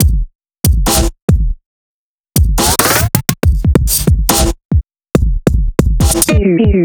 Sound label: drum